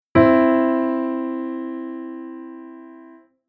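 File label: Chord Interval Major 2nd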